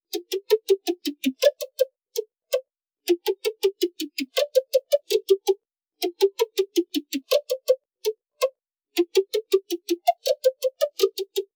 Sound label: Ghost Pluck